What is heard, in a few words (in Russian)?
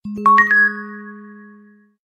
standartnoe sms soobschenie na nokia lumia 920